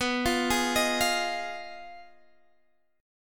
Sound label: F Minor 7th Flat 5th, B